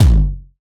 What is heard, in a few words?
Tom Low Crunch; Drums